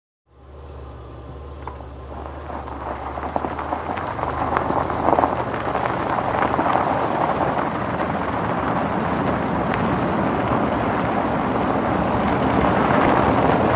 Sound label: The car is moving in the snow